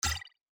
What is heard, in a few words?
UI Selected